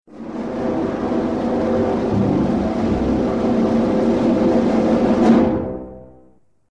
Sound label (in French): timbales grosse caisse; chasseur